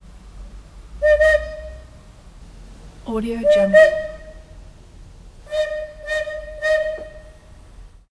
steam train whistle steam presence; prosoundfx

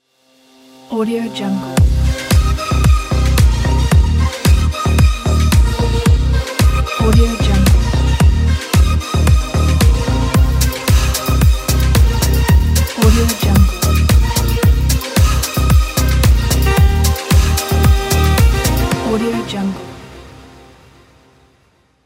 sexy dance logo

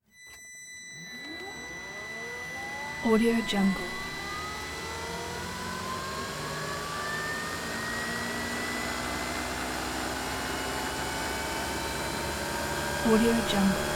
lafferty electric car sustained beep reverse; prosoundfx

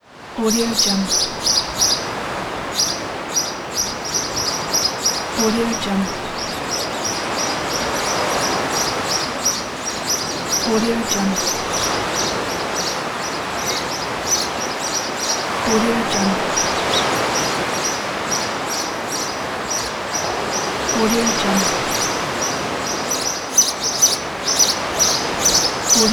sparrows on the background of the sea

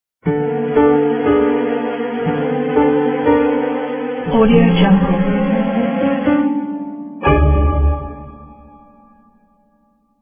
quirky mystery logo

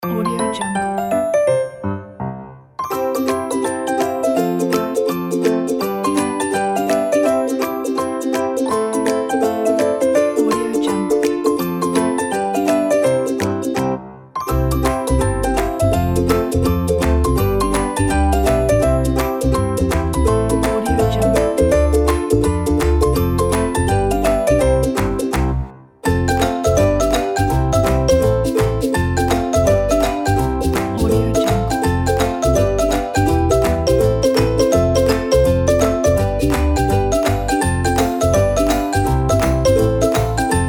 cute

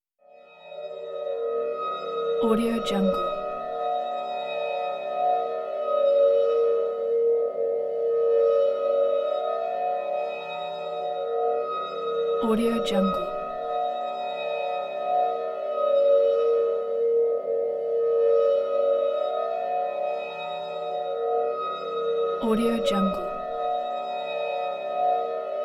time machine crystal

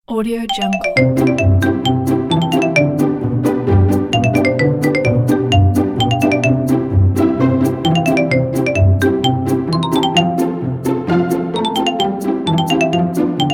childrens summer pack

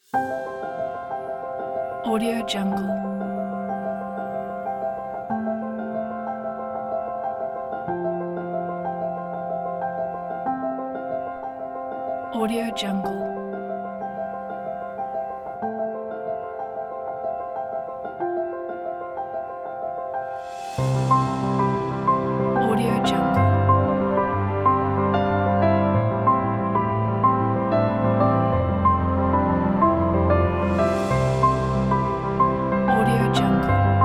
an inspiring piano pack

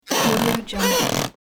squeaking wooden floor board

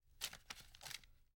notepad paper movement

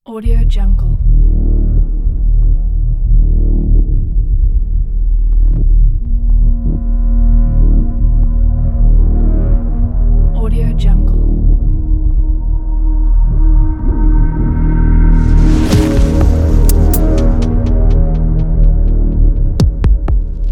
cinematic minimal dark tension soundscape

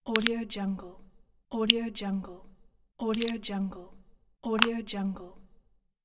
minimal click; evdokim-music

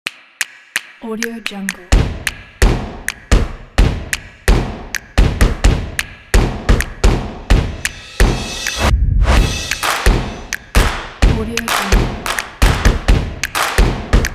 drum

